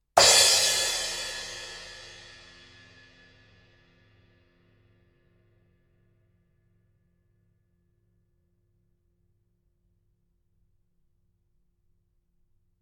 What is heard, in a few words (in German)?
becken